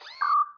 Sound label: map square select